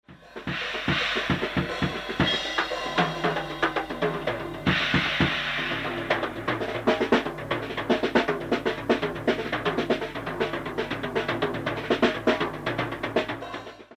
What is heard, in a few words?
Drumsolo